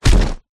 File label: human impact body fall clothes